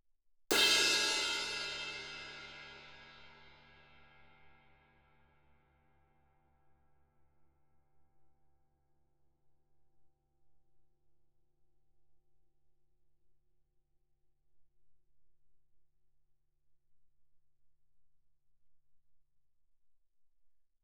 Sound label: crash stick shoulder ff, suspended cymbals; Percussion